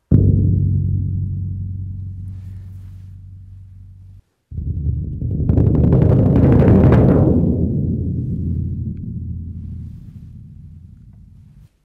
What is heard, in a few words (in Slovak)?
velky bubon, dychove nastroje; Veľký bubon (basový); bass drum; Basový bubon alebo aj veľký bubon, či kopák (ang. bass drum) je súčasť súpravy bicích nást